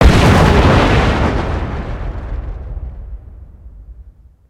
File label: ship explosion; destructibles